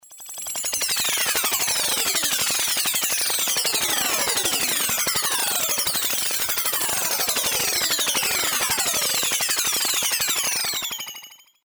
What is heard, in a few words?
Sci-Fi ringtones; clunky, analog sounds of vintage sci-fi films; beepzoid